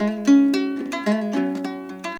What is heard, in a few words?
HALAM MELODY; Best Service; World Colours